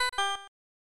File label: Chance Game Correct; pro mahjong kiwame; snes